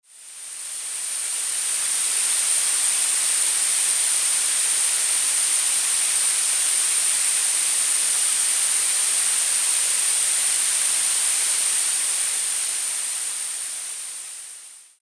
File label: 9 kHz Noise Masking; Tinnitus; Tinnitus Alleviator